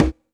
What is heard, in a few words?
PERC